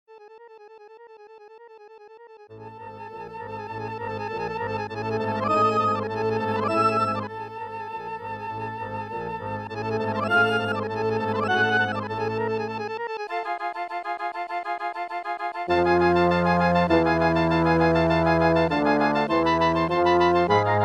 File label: midi; Bald Mountain